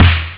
sdrum; ST-XX Amiga Soundtracker Sample Packs

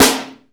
SNARE NICE; Techno Trance; Perc; E-MU Formula 4000 Series Vol. 2; OrbitPercMaster

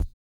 ELASTC TUBA, Vocal Blues Soul; Spectrasonics; Planet

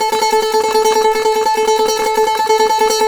SAZ; Spectrasonics; Hans Zimmer Guitars VOL-2

